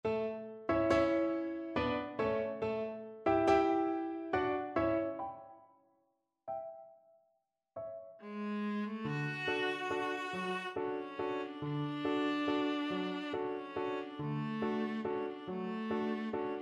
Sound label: viennese blood waltz, viola